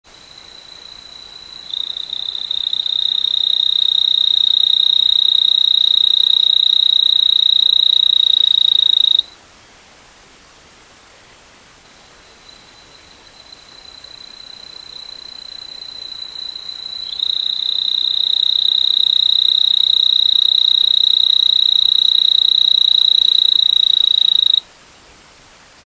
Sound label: Ameerega parvula